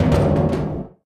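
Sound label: panels impact small, metal; Portal 2; Source Engine; video games; physics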